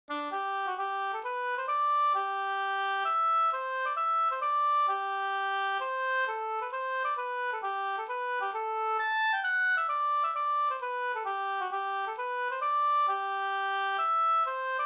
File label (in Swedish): hambopolska